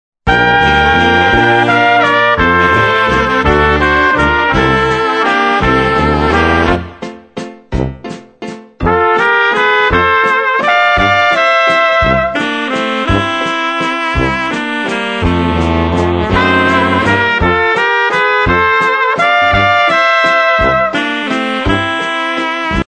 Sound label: waltz